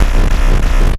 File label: Loopable Mechanical Malfunction Rumble